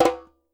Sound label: JEM F SLP, Percussion; Sampleheads; NYC Percussionworks; ST PERC VOL1